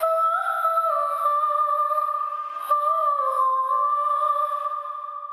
Voice key Amin 90bpm FX

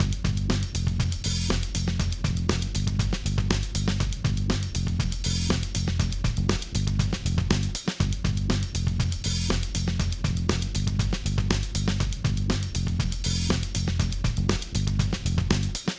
Drum and bass FX

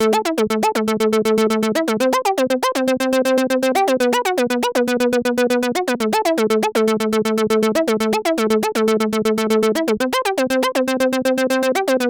Arp orig

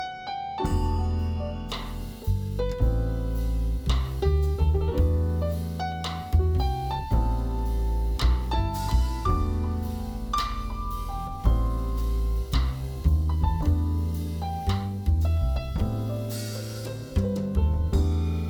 jazz ensemble; enna-telge-brighton

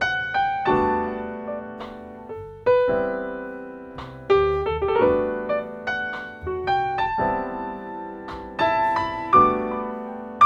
Piano preeq; osaka mix eq

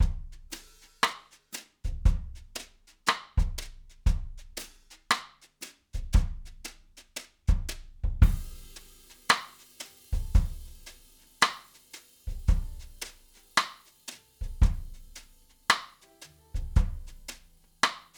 Drums preeq

